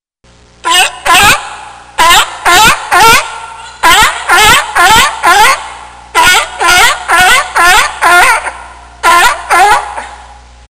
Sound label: California Sea Lion